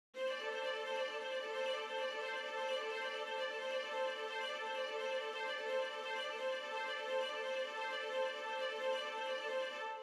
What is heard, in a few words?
Violins